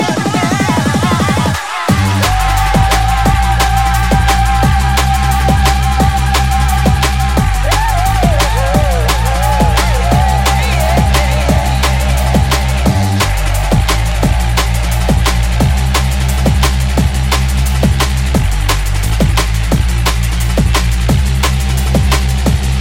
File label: sonic piano